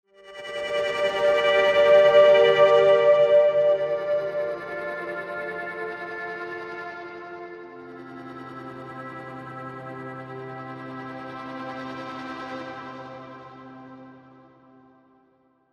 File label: Vocalise Pads; A Wall Of Wonder